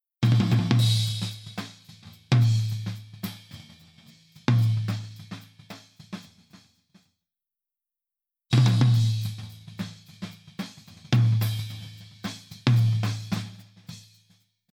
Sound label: Drums Tom H Tom Limit On